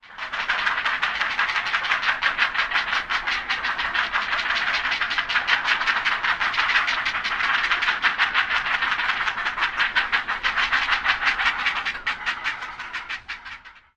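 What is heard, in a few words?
Great Blue Heron clattering of nestlings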